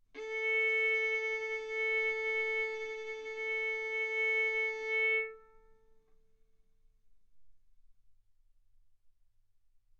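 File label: Violin arco mf A string A4 B4 stereo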